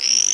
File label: bat; Ferazel's Wand Demo